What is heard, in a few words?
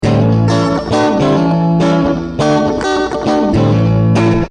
electric, guitar